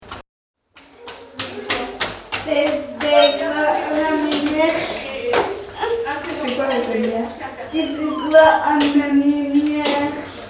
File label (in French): Communication Non Verbale; pathologie